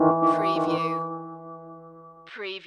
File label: Hip Hop Dark Rhodes Licks 90 BPM Swing - E